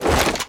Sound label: Swish Weapon Swing Swipe; SFX Kit